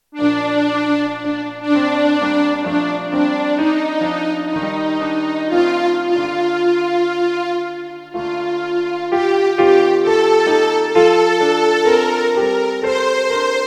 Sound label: ALTO instrumental